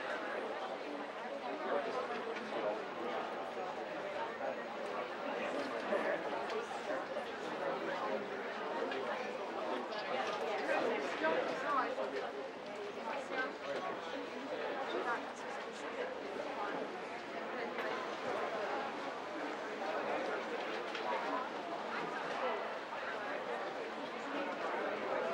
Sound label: Ambience - crowd small; gamerip; sim city societies